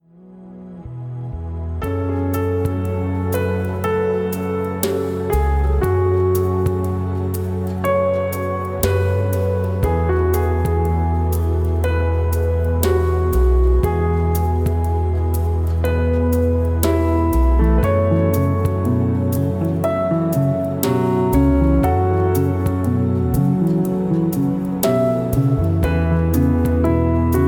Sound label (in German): Entspannungsmusik mit Klavier